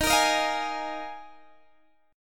D sharp Suspended 2nd Suspended 4th D sharp Guitar DADGAD